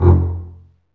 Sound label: STR, STRINGS; Roland - LA Composer vol. 1